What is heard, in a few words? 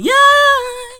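female vocals, Hip Hop; E-MU Formula 4000 Series Vol. 1; Hip Hop Nation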